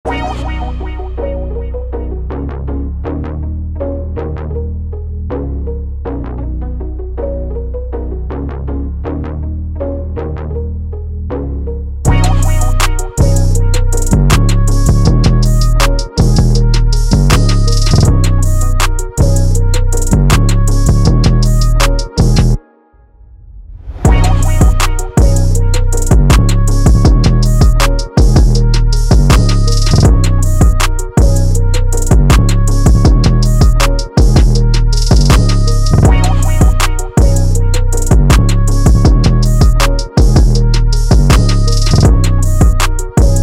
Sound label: Beat Vibes